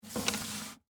Mail Box Insert, Household, Mail, Box, Insert, Mail; Miscellaneous Household Sound Effects; BlastWave